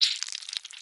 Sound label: Meat Move